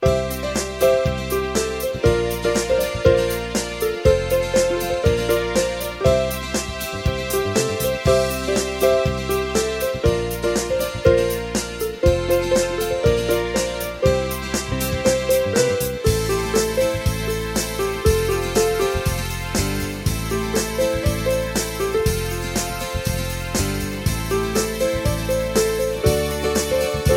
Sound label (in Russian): muzyka dlya igr